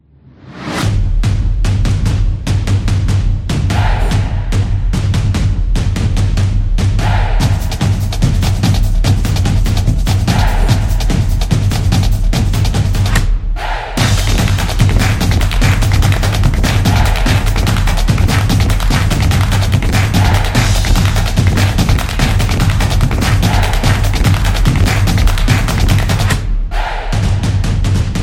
Sport Drums

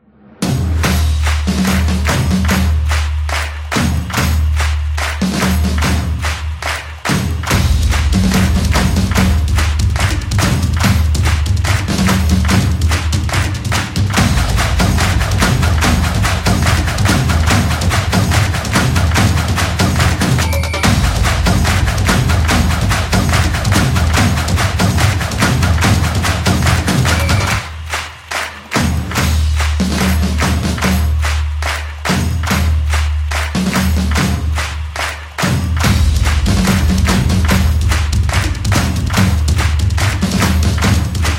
The Football Samba Drums; sport drums

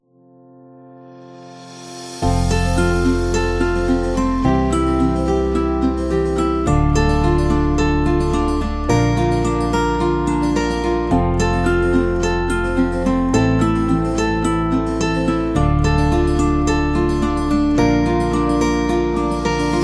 Guitar; happy kids

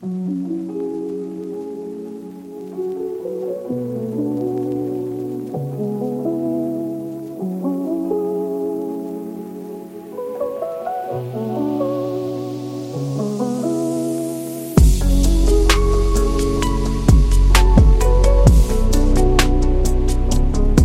Laidback Lounge Relax Beat

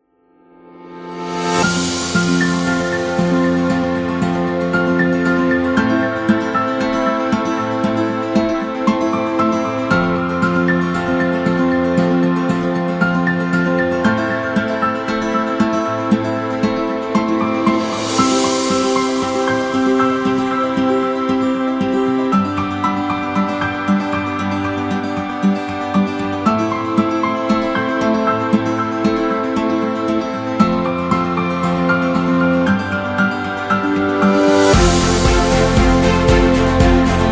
Love Happy

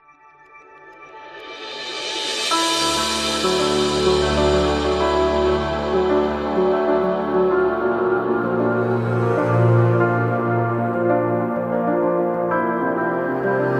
Inspirational

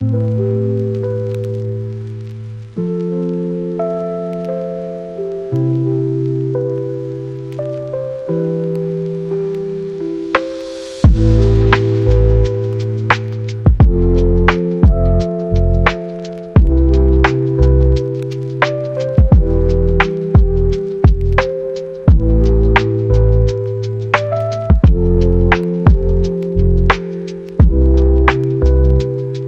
Luxury Lofi

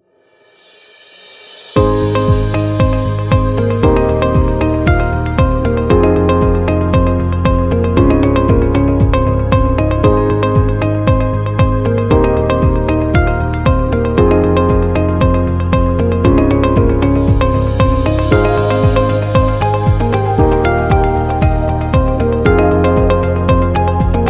Background Corporate; medical background

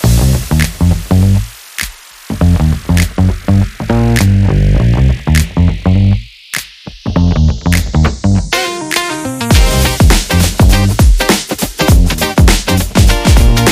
Upbeat Advertising

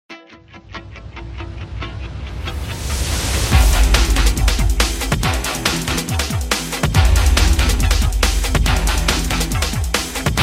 Extreme Sport

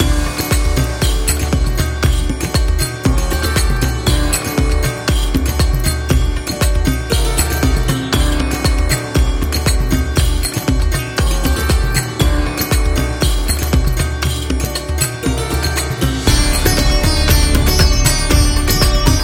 India Loop Music

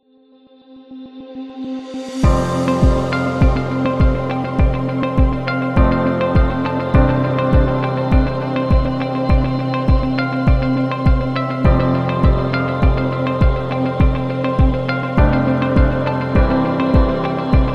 Corporate Ambient; explainer

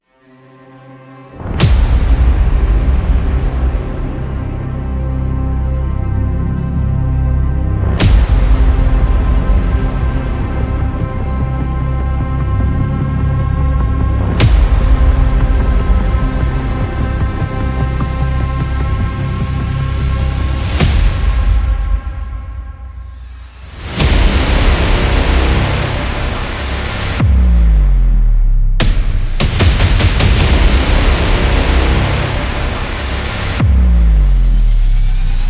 Epic Combat

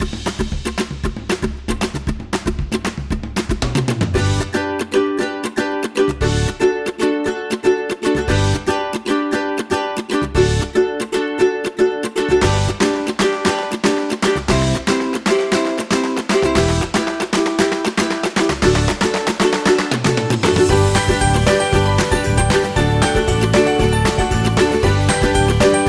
Upbeat Food

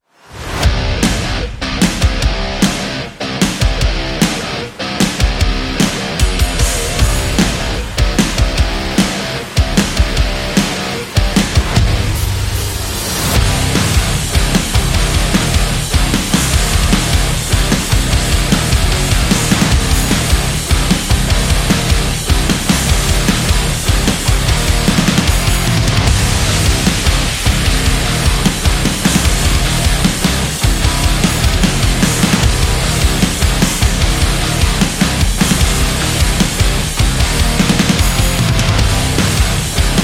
Intense Strong Drive, action metal and rock music

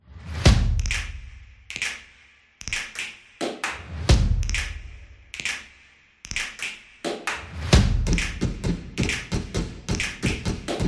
This Is Percussion Beat; soccer drums

